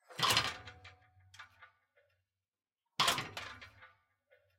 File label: Training; LOOPERS